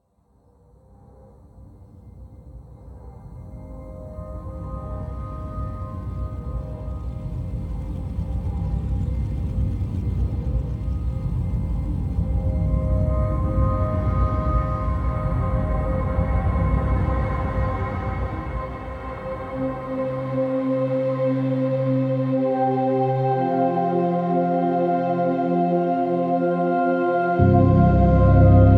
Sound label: star evolution dronar metal an